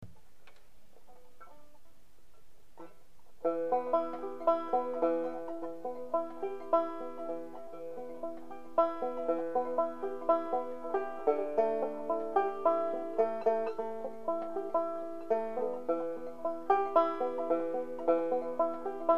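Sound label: slow picking, banjo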